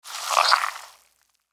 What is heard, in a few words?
Squish Fruit Food, Squish Fruit Wet Juicy Squeeze; Foley; Blastwave